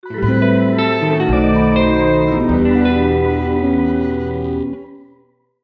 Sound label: Failure